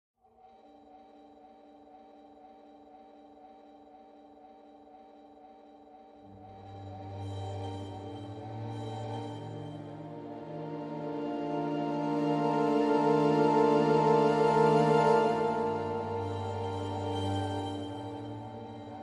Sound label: Strings and Bells